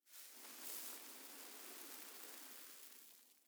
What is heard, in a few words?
sand gust; ambient; Counter Strike Model Pack; CS:GO; dust2